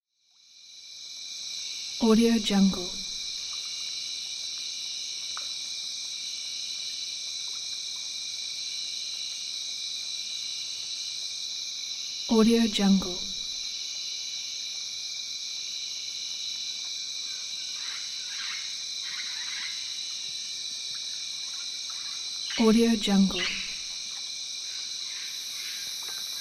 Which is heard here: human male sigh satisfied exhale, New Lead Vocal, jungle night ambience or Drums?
jungle night ambience